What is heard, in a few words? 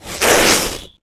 attack; spider